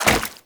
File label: Wall Jump Goo; Warframe; Tenno; Wall Run